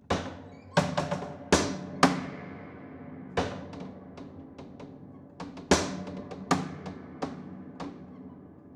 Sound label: Panels Slap Ped Dense, Piano; Piano Destruction